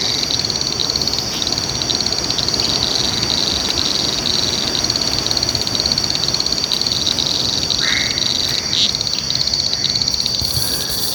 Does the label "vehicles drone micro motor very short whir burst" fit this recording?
No